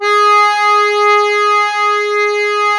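DIATO G#2, diatonic accordion, Historical Instruments; AKAI; USB Soundscan vol.23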